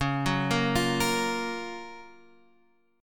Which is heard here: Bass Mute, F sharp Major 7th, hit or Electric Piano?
F sharp Major 7th